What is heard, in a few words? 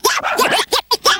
SCRATCH; E-MU Formula 4000 Series Vol. 1; Hip Hop Nation